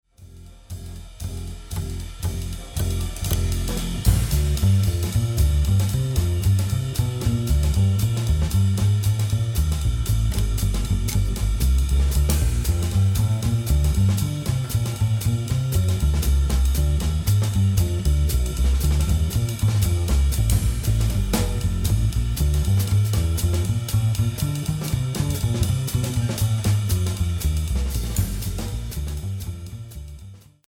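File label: Bs Dr, bass drums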